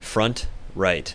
Music Front right

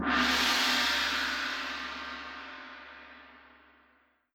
Game Start; Ninja Up; android

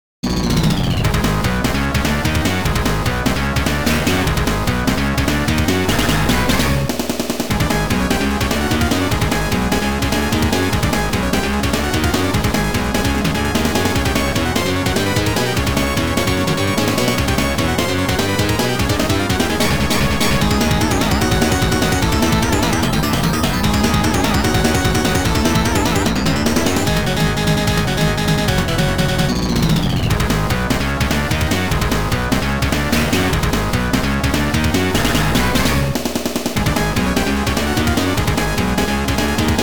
Boss Fight; ps4; xbox 360; xbox series xs

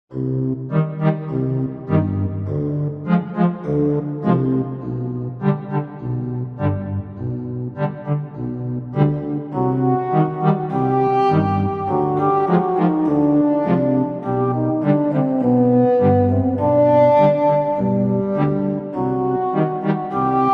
Several Variations Of Funny Birds; Little Misfortune OST

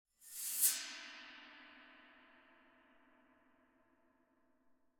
Level Start

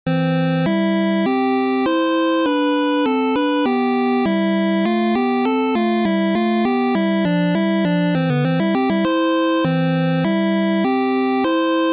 Level Up; Game Sound Museum Namcot; Mindseeker; Kerunaguru

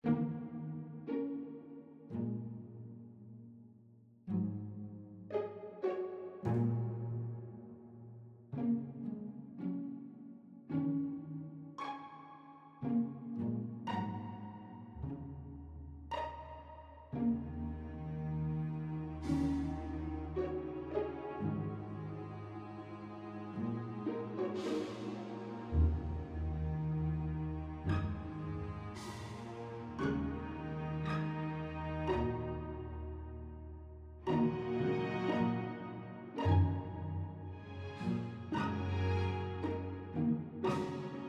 asian strings; The Stonecutter

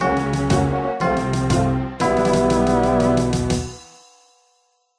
Dice loss; Death Plateau

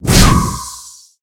Pickups Powerups

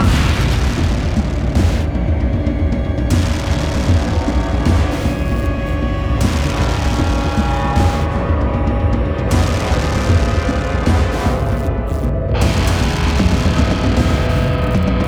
Horde Triggered Loop; Chasing Dead; Wii U

